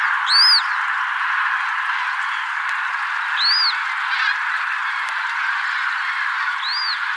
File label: Common Crane Grus grus